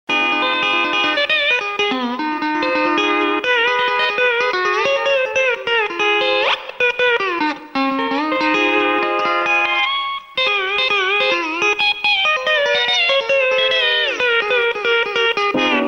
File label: song on the steel guitar; Ed Edd n Eddy